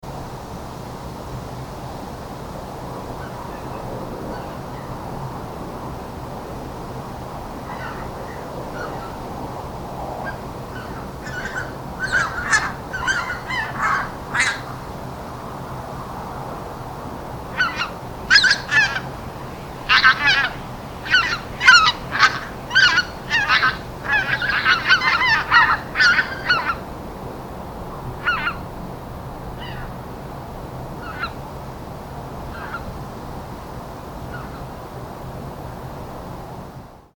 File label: Greater White-fronted Goose Anser albifrons; waterfowl